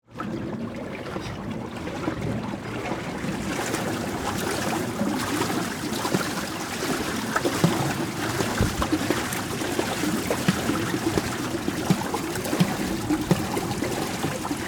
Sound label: Boat Paddle, Aluminum, Paddle, Boat; Transportation, Watercraft; Boat Sound Effects; Blast Wave